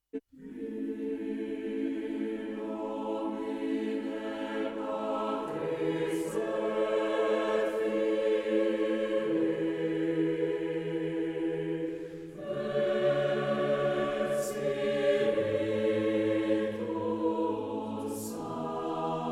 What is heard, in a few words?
Choral Music a Cappella; Napoleon Total War original soundtrack 2010